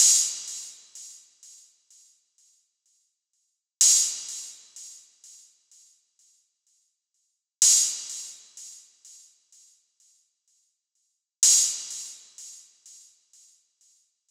hat

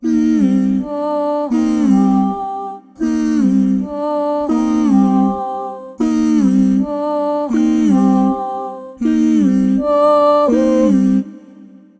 80 vocal rhythmic trading hockets harmonized Cm

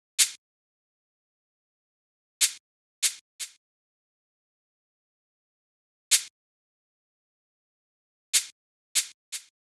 162, D, shaker; VALVES & VIBES

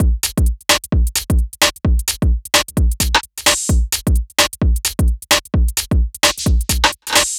130 Drum Full; Soaker

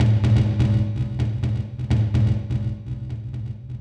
126 Perc FX

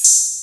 Op Hat

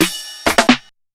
Fill

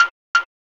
F Shot Synth

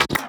PERC

